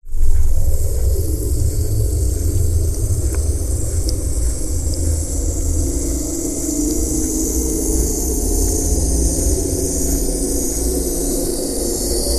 transport boat motorboat pass underwater recording hydrophone